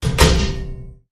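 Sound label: Hit Organic Clang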